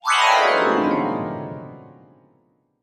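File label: Music Transition Vibraphone; mallets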